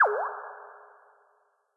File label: water